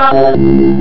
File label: Beat box ba-ba-ba; Instruments